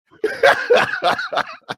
laughing; Gerald

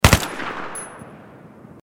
G36c burst outside

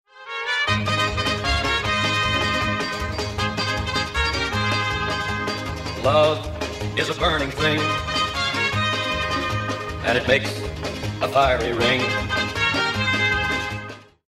hahaha

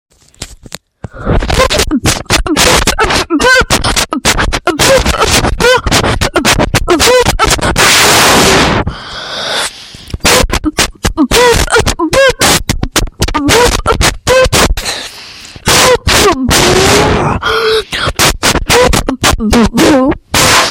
the loudest beatboxing ever

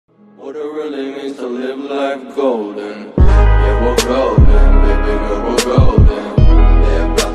donate music; ninjet

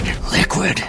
liquid

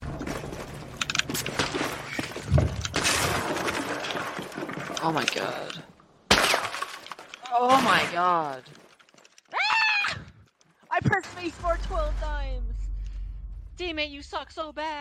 scared; Beyond Scared Straight

